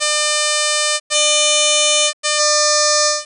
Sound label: 600 Time Sep 30-150